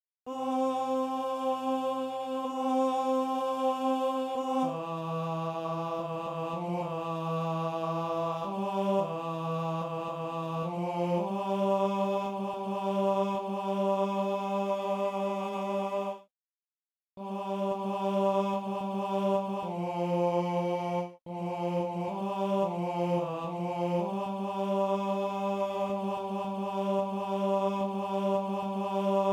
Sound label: Tenor